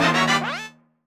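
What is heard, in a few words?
Fanfare Get Nothing, gameplay sounds; Phantom Hourglass: "Get... Nothing?" fanfare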